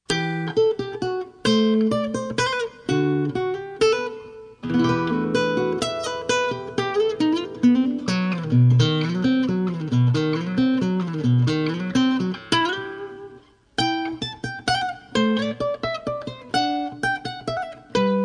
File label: guitar suite